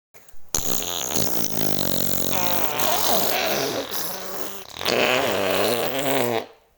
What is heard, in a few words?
fart